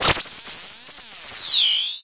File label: mechanism, combot; hl2